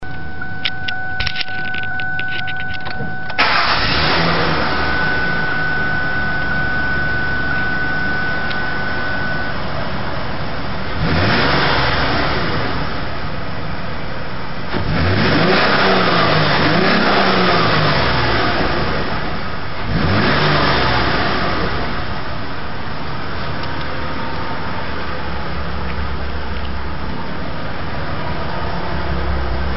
taurus engine, Starting and revving up the Duratec to 3 or 4k a couple of times; 2002 Ford Taurus